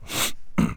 idle nose

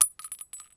Inf Weapons; Red Orchestra 41-45

